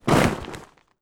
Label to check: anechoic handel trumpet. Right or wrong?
Wrong